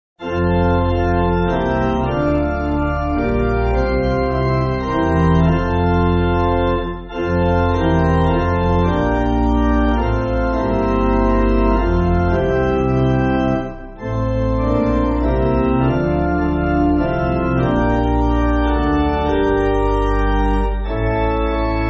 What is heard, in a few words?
Pipe, church music; Lord You Have Searched; Winscott